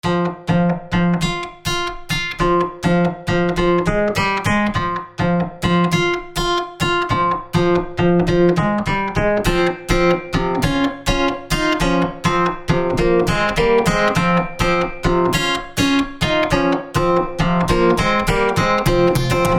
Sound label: acoustic and vocal